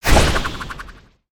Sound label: Spinjitsu Ice Shuriken Project